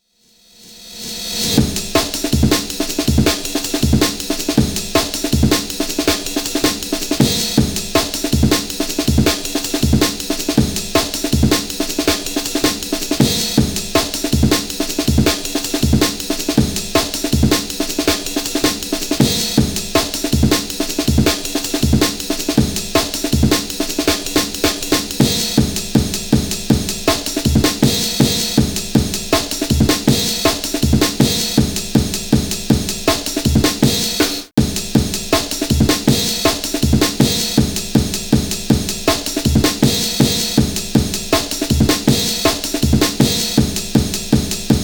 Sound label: 160 breaks; A Hole; Lockdown Breaks